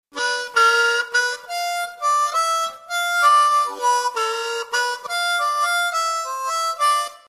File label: Tongue, harmonica